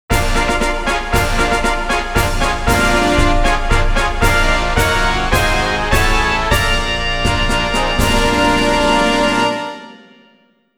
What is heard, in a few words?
General Race Fanfare; Kyoto - Hanshin